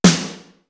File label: DARKER, East West - Global Sounds; D4 BD SD TOM